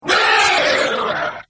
demonic hit

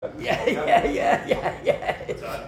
laugh light

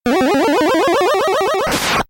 charge; mega man

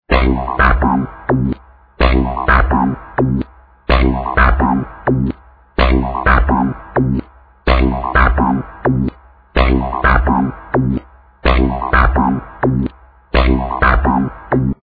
robo music

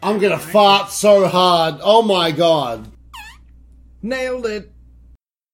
farts

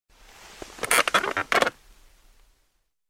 wood rocking horse squeaks

